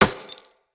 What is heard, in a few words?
Bullet Impact Glass Large; Weapons